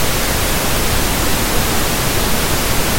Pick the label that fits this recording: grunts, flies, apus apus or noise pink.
noise pink